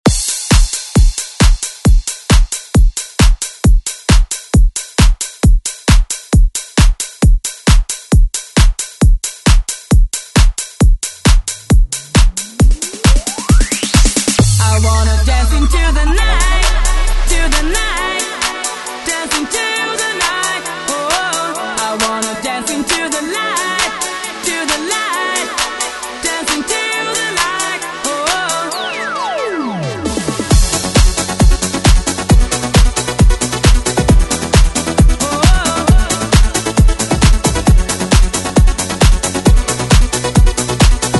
90s Re Drum; DJ Mhark

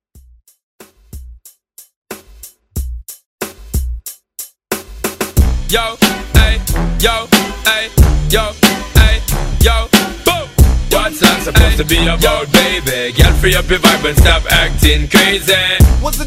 Old School Mash Up

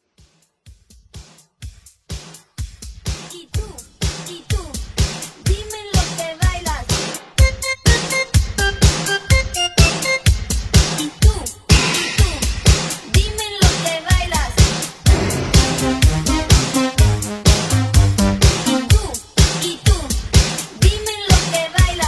Classic Intro Blend House

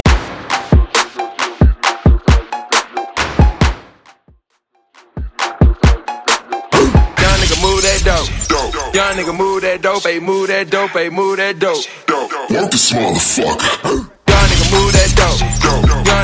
Trap RMX

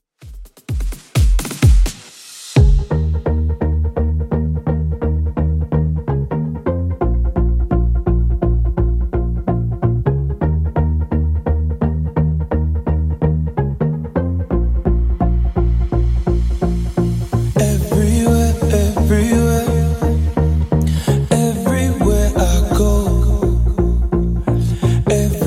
2ks Redrum 128bpm

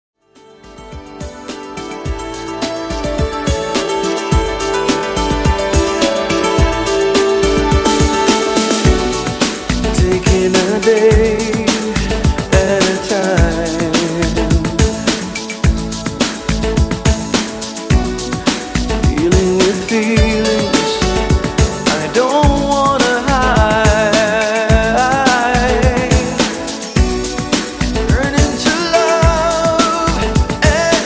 90s Extended Redrum 106Bpm

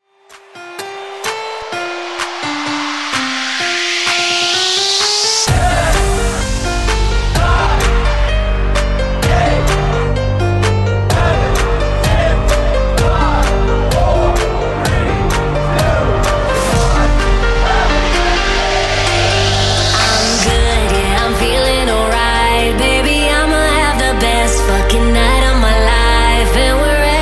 NYE Slam, 128Bpm

